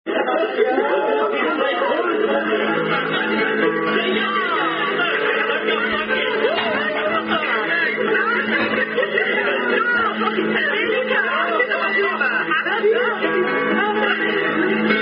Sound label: Unknown German Keyword Drinking Game, Song In German